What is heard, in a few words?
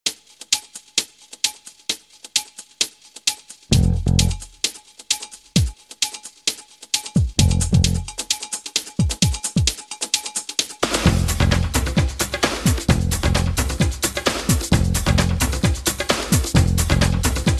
Music Tone